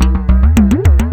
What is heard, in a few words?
WAVEDRUM; Back In Time Records; Big Bang